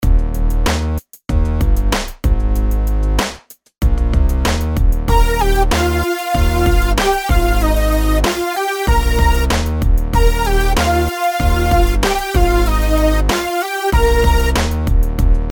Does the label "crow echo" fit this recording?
No